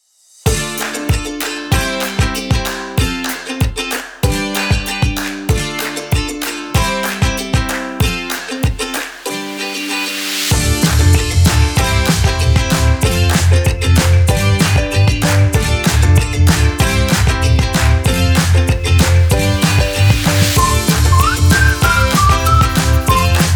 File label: Running in the forest Upbeat Optimistic Energy; HAPPY/UPBEAT